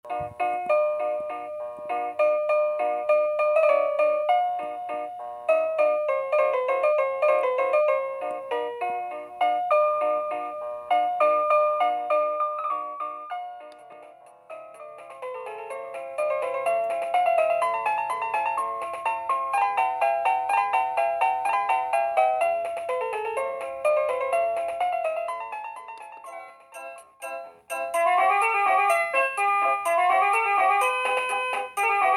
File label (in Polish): pokaz dzwieku alarmu